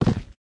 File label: plexi land; Golden Crayon Sounds